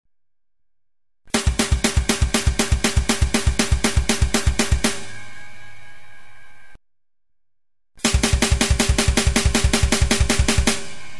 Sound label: blastbeats